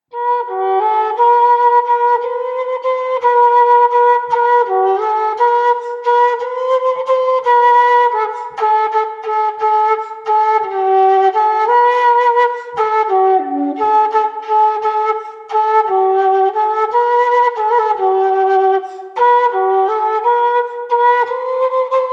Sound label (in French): Romance; Romance du Damoisel de Cervance